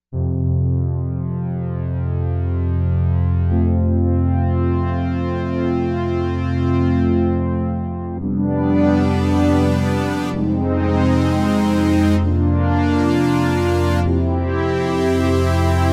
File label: Orbit Pad